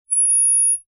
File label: ELECTRONIC FEEDBACK